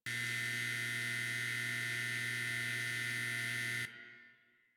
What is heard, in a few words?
Appliance Medium Loop; Ambience; Props; Warframe; The New War; Zariman; Quests